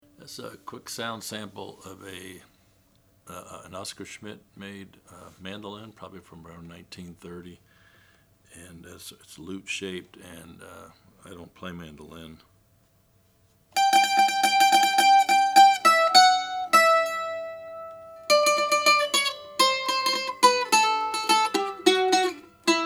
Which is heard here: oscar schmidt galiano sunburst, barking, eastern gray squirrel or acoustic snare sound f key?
oscar schmidt galiano sunburst